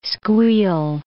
squeal

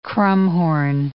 krummhorn

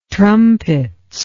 trumpets

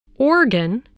organ

